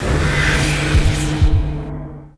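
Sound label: heart pressure; Higurashi no Naku Koro ni Matsuri; PS2; SFX sinzouappaku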